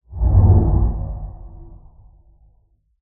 Whoosh bass dark muted rapid swell slight pitch descend passby r-l decay; with tonal